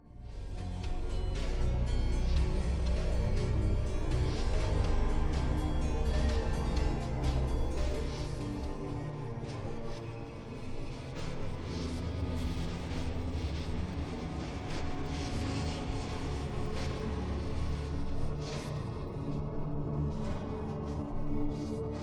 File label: Noise